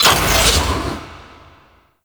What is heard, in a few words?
Moon Switch Medium Start; Ambience; Gameplay; Orokin; Warframe